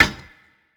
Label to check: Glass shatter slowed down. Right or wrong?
Wrong